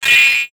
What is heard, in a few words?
Multimedia Internet CD-Rom Flash Hi-Tech